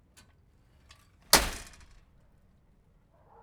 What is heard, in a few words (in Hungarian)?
kertikapu